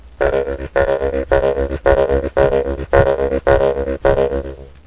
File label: wawa; Software Explosion; Fore-Matt Home Computing